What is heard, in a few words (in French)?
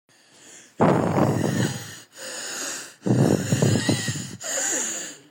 asthme